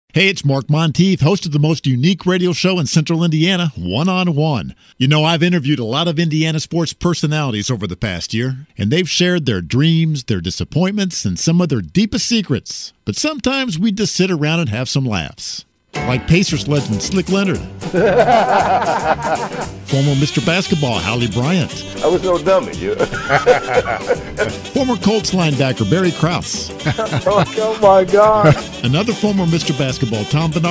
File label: Promo laughs